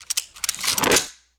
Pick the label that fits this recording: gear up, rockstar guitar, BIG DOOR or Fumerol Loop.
gear up